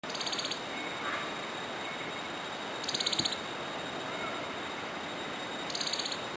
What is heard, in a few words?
Dark Necked Tailor Bird concern call; Amar